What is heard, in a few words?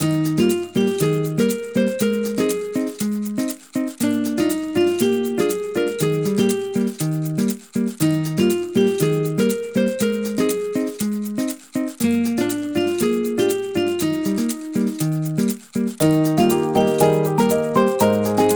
Generic Minigame; peppa pig world adventures